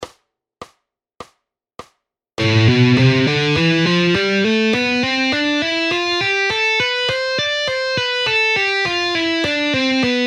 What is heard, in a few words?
Guitar speed exercises